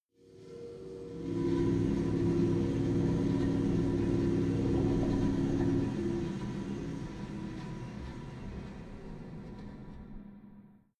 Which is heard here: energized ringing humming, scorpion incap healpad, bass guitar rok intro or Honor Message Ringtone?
energized ringing humming